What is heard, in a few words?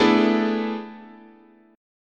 A Major 7th Suspended 2nd Suspended 4th, chord, guitar